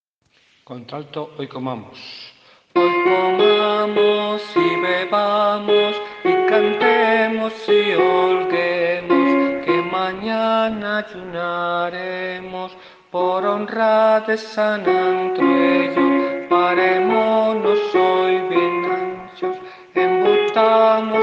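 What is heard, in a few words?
alto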